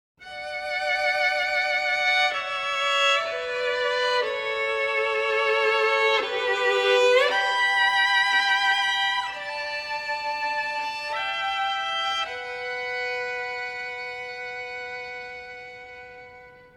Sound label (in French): Sonatine Pour Violon et Piano - Andante